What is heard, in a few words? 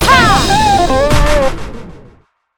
wave; astro Legends Lyra and Erion Desktop; Foxium